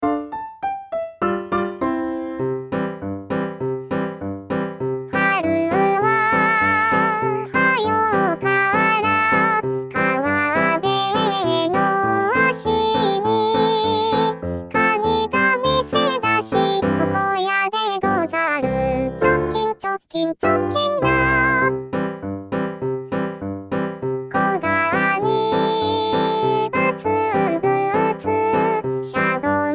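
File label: soprano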